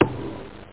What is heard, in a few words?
Dart; arcade darts